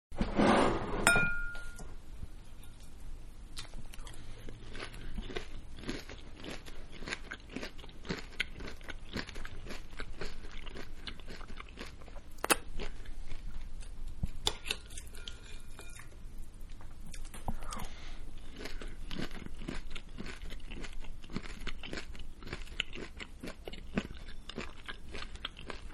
Cereal Eating; Human; Manhattan Production Music

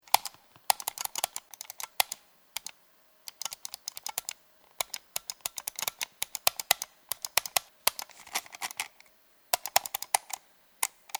Mouse Clicks, Rapid computer mouse clicks.

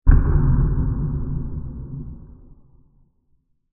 Big Dark Explosion; Explosions

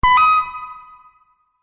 Multimedia Event Notification, beep sound effect

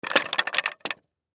Croquet Mallet Pile Set Down; SoundEffects-Blastwave; Sports

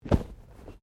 Bodymovement Fall Light

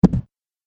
Body Slam Impact, wrestling and struggling

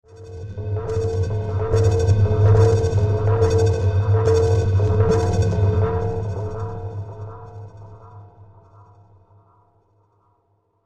Alien Bio Scan; Alien Sound Effects; science fiction sound effect; Andrew Fleming

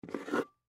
Packaging Tape; Tape Dispenser Pick Up Table; Industry; Blastwave

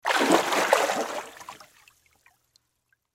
Object Surface, Water Object Surface Splash; Splash Sound Effects; Splashes; Blastwave

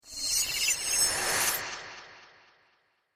Sparkle Flutter Zoom, whoosh; Whoosh Sound Effects

